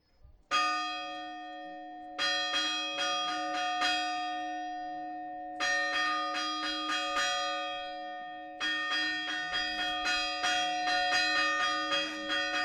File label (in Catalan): campana